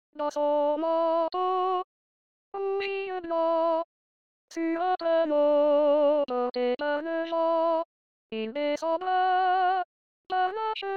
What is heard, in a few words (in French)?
femmes